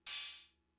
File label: Cymbal, Fricke MFB-522, Drum Machines